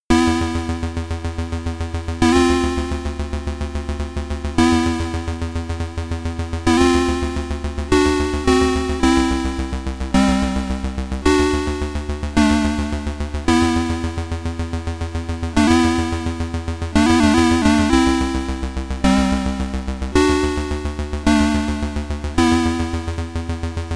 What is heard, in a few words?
Interval; rygar commodore 64; SID Stereo